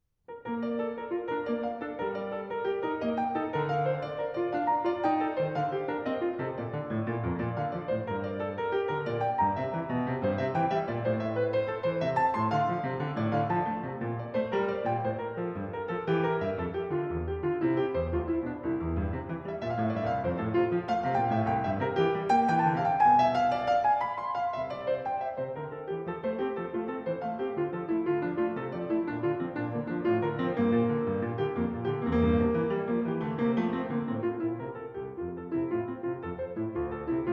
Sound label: Partita no. 1 in B flat major, Courante; BWV 825